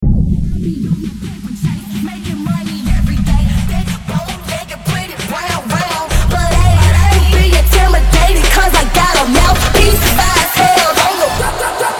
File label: brazilian phonk